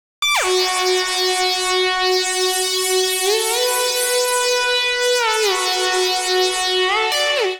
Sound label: Catacomb Lead Bm 124 Bpm; MIDI Clips; Music; Ableton; Factory Packs; Vibrations; ModeAudio